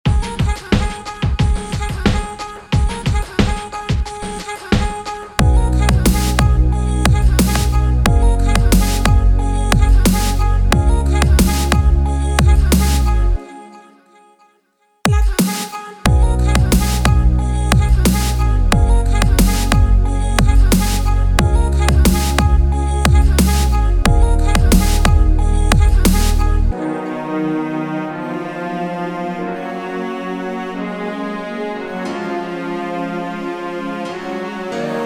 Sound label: Midi Yamaha